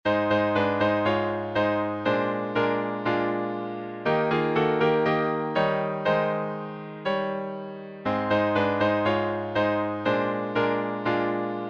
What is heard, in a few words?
Hymns